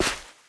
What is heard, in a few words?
step sand